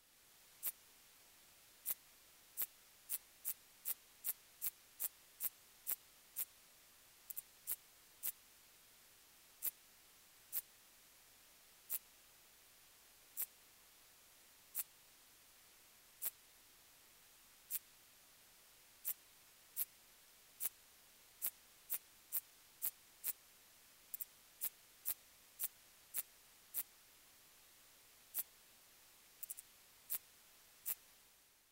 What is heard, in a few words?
sickle bearing bush cricket